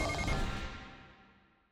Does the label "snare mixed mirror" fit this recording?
No